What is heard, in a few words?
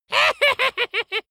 dwarf laugh